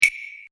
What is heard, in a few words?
WoodBlock; BeachFront